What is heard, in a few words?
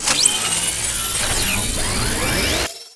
spectre overload close; Titanfall 2